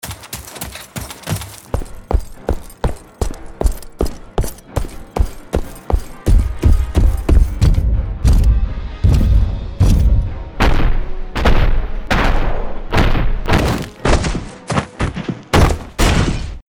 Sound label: Foley & Impact; Cartoon Game Sound 2.0; JBoB Sound Pack Demo